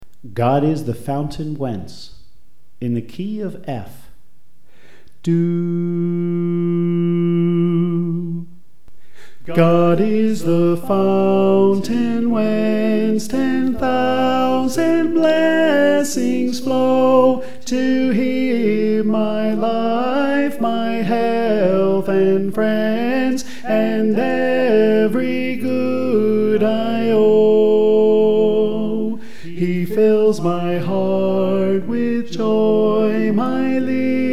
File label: Tenor